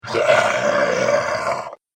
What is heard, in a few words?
Scream Demon; Monster Sound Effects; horror sound effects